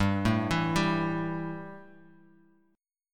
E Minor Double Flat 5th G, guitar

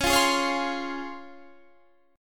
C sharp Major 7th C sharp, Guitar Standard; 21,20,18,18,18,20; C#M7 strummed; capo=3

